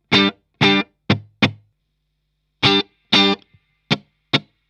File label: reverb; engl reverb